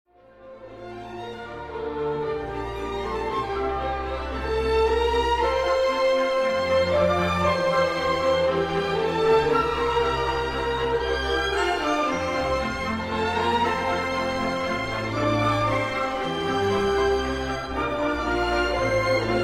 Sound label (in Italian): allegro scherzando, violin concerto